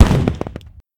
Fireworks Explode

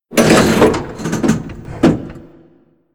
Eject Switch; Vog; moul-assets

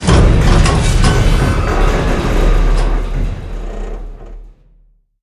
Elevator Strain

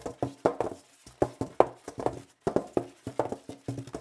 Styrofoam Roll

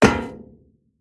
Metal Run; moul-assets

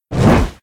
Flapping; Bahro

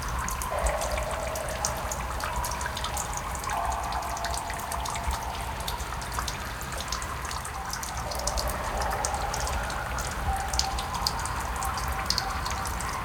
Amb loop

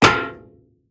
Metal Run; moul

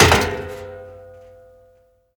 Metal Jump

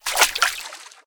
Water Walk

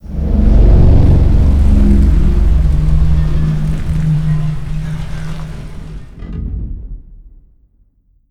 Bahro Hydrant Down

